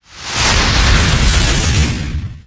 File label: Skill wind magic; FlyForInsanity